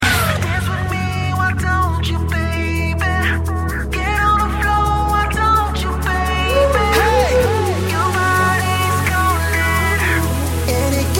hip dance, hip hop ringtone

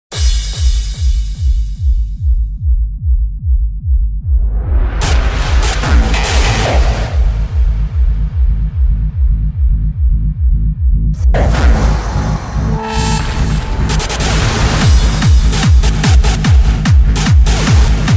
Hardstyle Bass

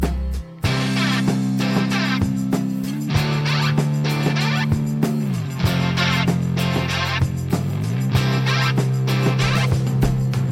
instrumental; rock

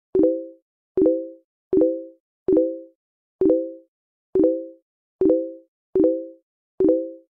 facebook chat, ringtones sms

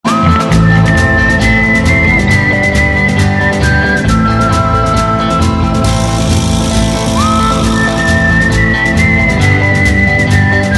western; ringtones

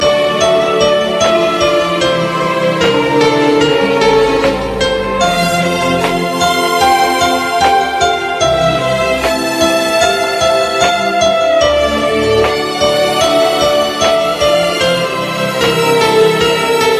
ahwak music

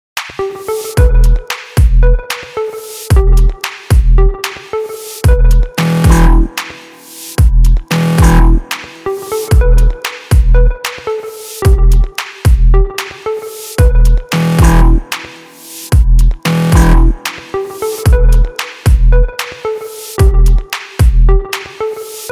club chill

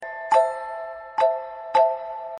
e-mail

